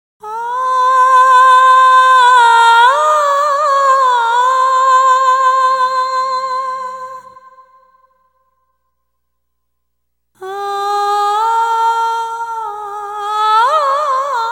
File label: chant